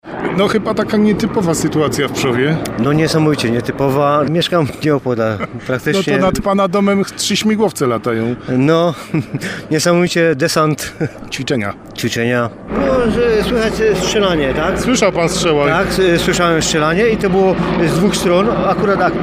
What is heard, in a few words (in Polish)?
helikopter; pszow